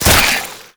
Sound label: detach, headcrab; creatures; headcrab classic